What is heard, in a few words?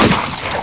SHOT